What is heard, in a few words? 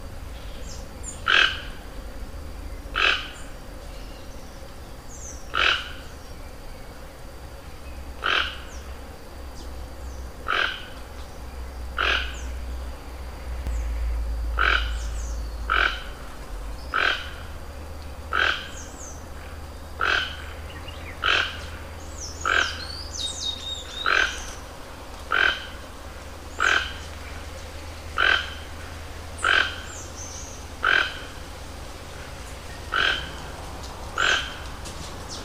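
Ramphastos toco, bird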